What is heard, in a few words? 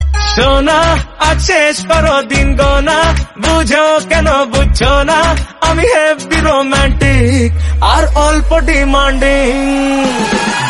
Bengali song ringtone